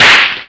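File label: Crack, Roland MV8800 drum; SP-8800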